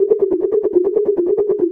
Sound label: timeout; openspace3d; vrcells